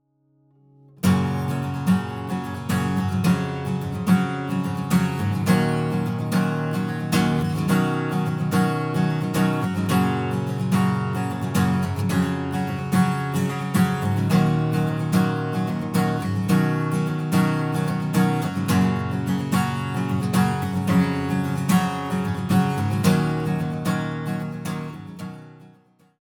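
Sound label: guitar strum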